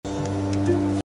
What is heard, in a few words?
walk on stone; Unravel Two